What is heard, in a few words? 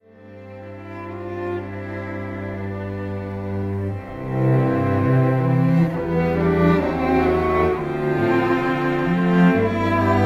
strings